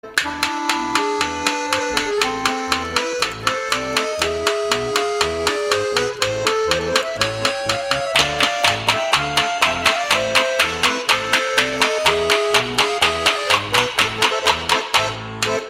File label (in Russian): Lozhki